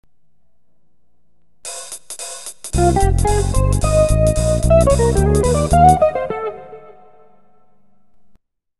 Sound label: jazz